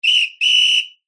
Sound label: sport referee whistle 10 metre distance